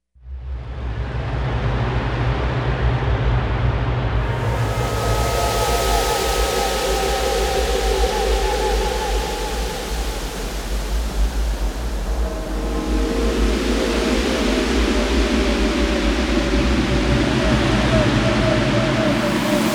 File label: Sea Storm